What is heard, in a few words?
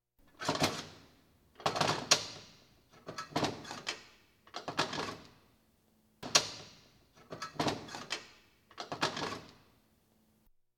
Police, Fire engines